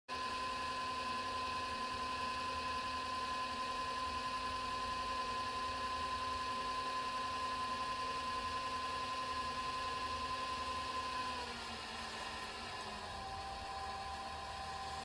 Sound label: pottery